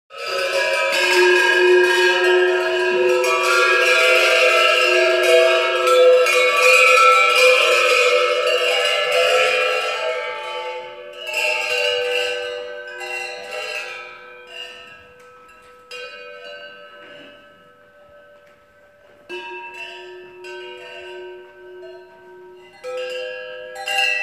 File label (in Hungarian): harangjatek